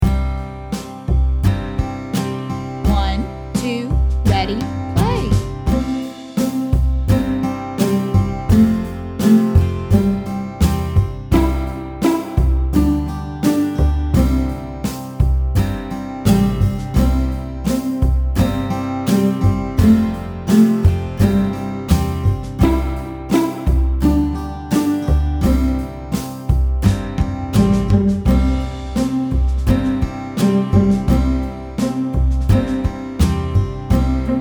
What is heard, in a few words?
Old MacDonald Multiple Verse SLOW Tempo WITH INTRO, ukulele; Rainbow Ukulele Play-Along